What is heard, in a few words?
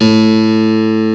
HARP SIM; KEYBOARD; M8; CMI Factory